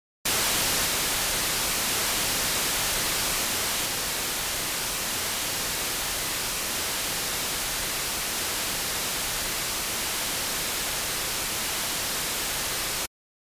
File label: Noise